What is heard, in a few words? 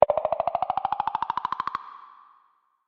point Count